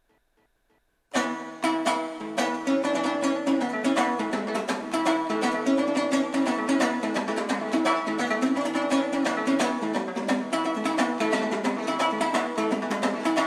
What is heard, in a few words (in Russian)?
играет на домбре; Яшкульский р-н